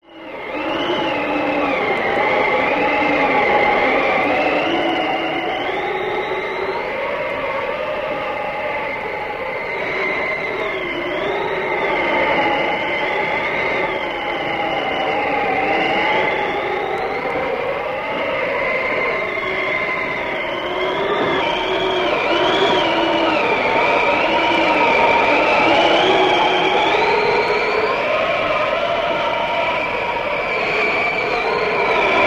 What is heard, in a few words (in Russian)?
Novogodnie zvuki ZIMNYAYA VYUGA; pesni pro vyugu